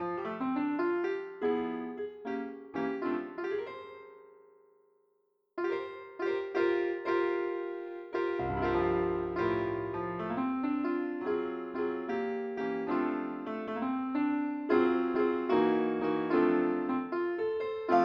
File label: piano